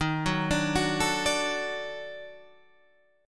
D sharp Minor Major 7th Flat 5th, Guitar, Standard; arpeggiated